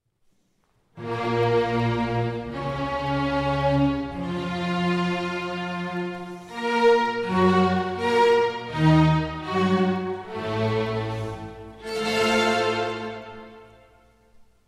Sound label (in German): Sinfonie Adagio Allegro; 200 Todesjahr Haydn